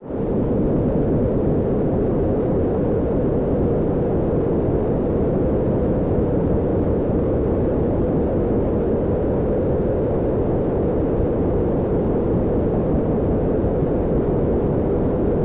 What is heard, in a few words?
atmosphere digital cyber bit; atmospheric; ambience and background fx; nova sound